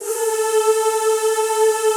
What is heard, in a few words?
ZZZ A3, Classical Choir; Best Service; Extended Classical Choir